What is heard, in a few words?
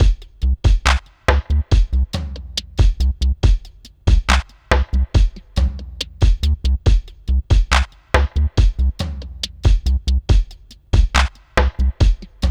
Electronic, Drums & Percussion